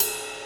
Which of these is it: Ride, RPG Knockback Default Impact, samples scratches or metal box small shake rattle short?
Ride